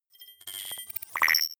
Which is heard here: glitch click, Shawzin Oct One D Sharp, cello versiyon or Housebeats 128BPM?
glitch click